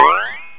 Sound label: spring